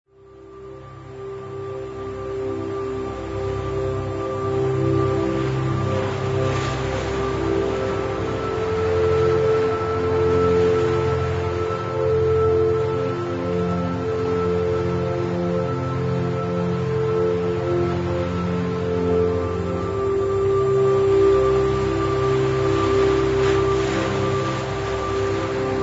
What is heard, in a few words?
Hypnotic Seashore Music